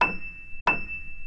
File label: E7 mezzo, pianosound